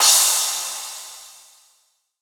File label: Drum Hits Crash; Suflu